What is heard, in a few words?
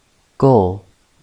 goal